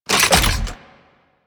Flak Cannon Reload; Warframe; UT Flak Cannon

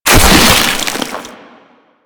Proto Infested Maggot Pain F, Enemies, Vocals; Warframe

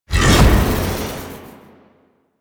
Army Cast Release; Warframe; Warframes; Hoplite

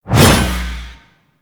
Backstab Throw; Enemies; DE; Warframe; Warframes; Pax Duviricus

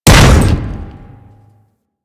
Hammer Impact Default B; Warframe; Weapons

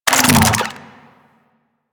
Infested Big Ashen Move Start; Warframe

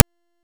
Hunter Bow Draw; Weapons; Enemy; Bows

